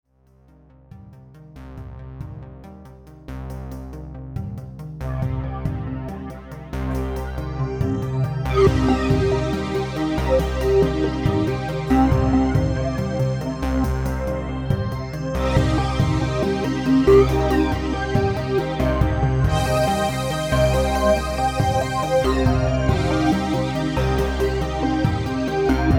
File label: Phase Processor